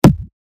ブロック インパクト

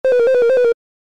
グルグル レトロ

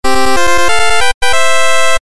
レベルアップ ファンファーレ レトロ

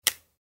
カードを出す; ボードゲームやカジノ、麻雀の効果音です

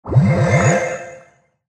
ポーション

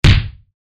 打撃; 武器効果音

